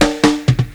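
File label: snare roll, Ragga & Dub Reggae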